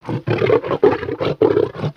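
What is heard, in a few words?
Pets Triceratops Ecstatic; creatures; Lego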